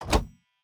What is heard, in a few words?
reel bounce; spinsane; netent